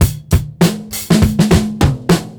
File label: beat, Roots Funk; USB Soundscan vol.41; AKAI